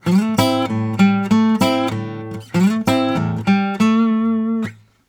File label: Blues Guitar Samples